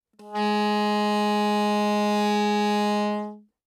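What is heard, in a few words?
Soprano Saxophone non vibrato ff Ab4; Woodwinds; Bb Soprano Saxophone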